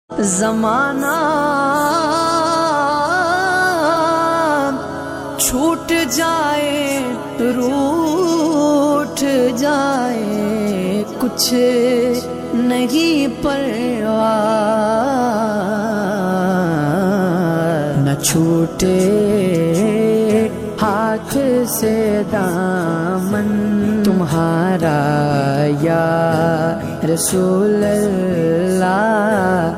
islamic ringtone

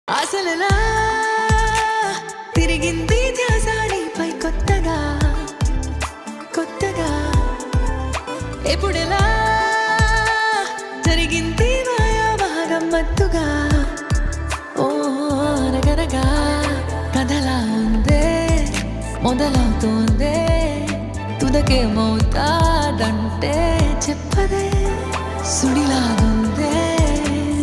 telugu ringtone

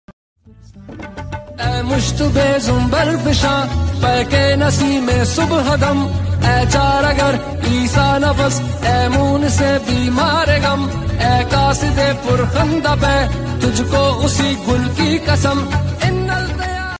islamic ringtone

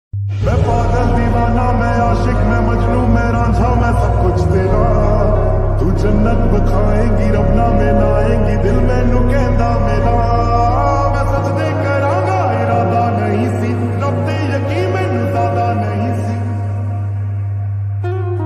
slowed punjabi ringtone

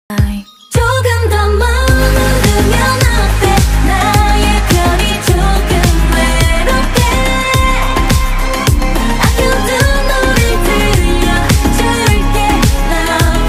Korean ringtone

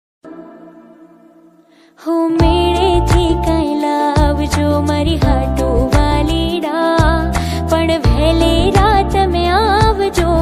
gujarati ringtone

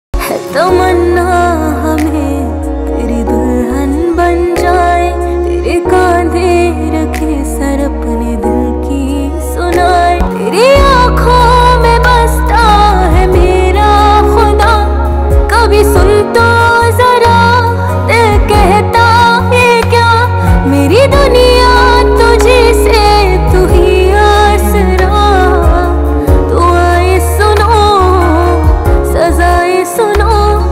female version ringtone

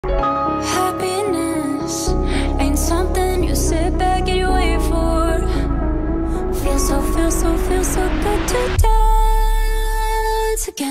english ringtone

